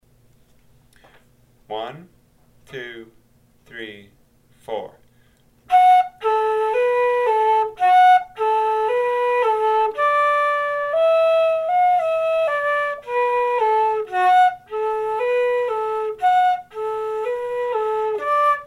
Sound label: Kerry Polka slow